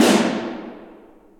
Snare

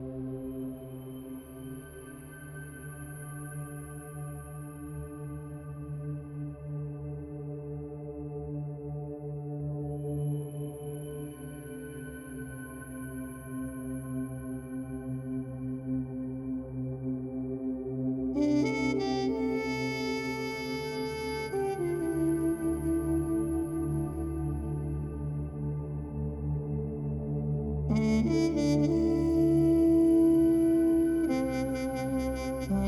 Space 80s Ambience; Landinar Into the Void

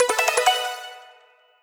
menu unlock; vitamin connection switch gamerip 2020

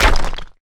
Drop Bricks

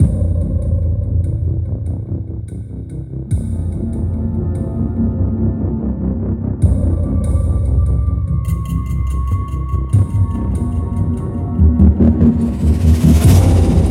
bgmusic exploration; Iron Marines

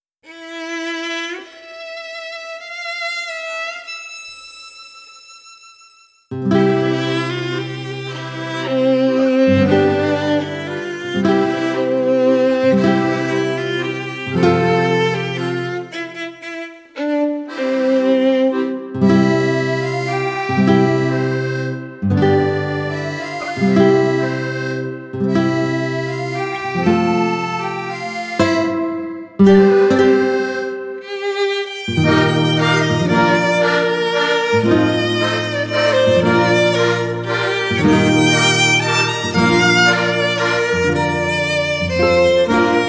Small French Port; pirates of the burning sea game soundtrack 2007

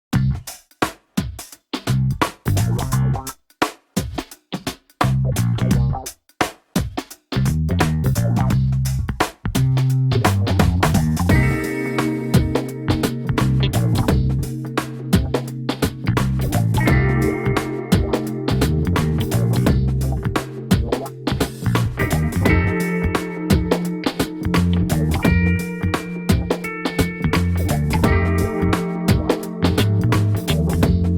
Substory Music; yakuza kiwami unreleased songs ps4 gamerip 2019

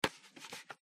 Pickup Hammer; The Joy of Creation Halloween

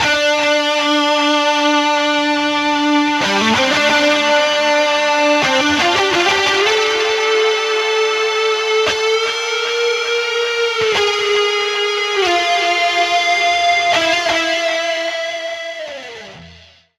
Metal Solo; 93 Refurb

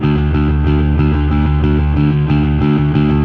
Fuzz D1

